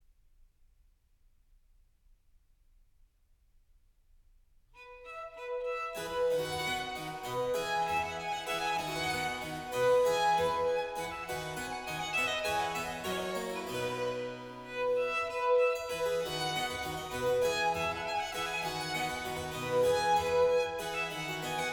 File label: sonata in f major gavotta allegro